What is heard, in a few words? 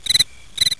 Birds; cs vicus